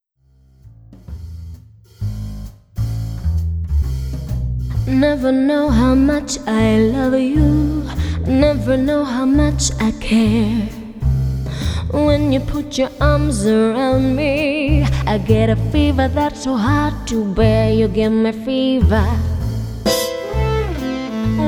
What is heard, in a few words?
jazz